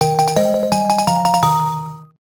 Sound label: Key item get; infinitefusion